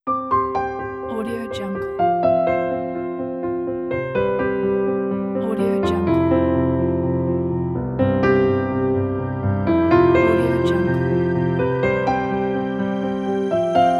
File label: commercial piano logo music